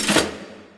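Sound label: hall elevator door; hl2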